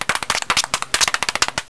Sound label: TAP DANCE